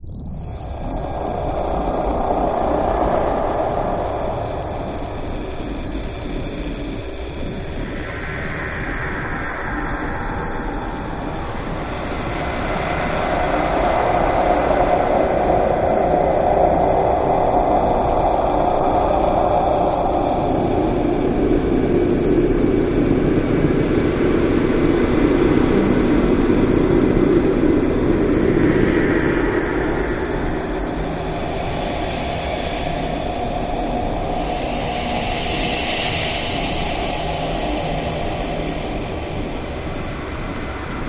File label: Fractal Bit Static, granular